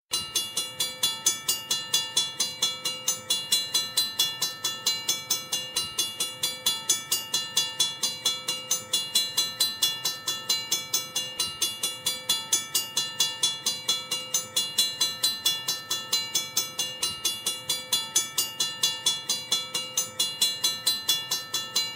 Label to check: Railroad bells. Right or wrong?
Right